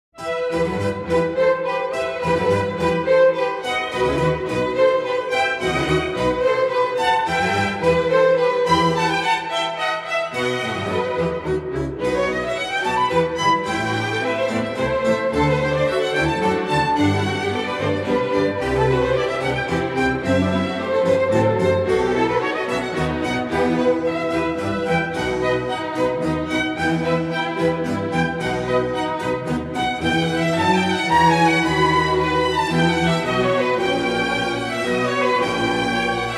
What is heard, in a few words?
Concerto for Violin; vivaldi; Tartini; Famous Violin Concert